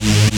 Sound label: SYN TECHN, dance synth; Sound & Vision - Gigapack I CD 2